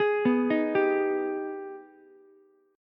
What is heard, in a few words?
G sharp Minor Major 7th G sharp down Ukulele; arpeggiated; JGuitar